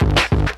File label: turntablist, Drum loops, Kick Snare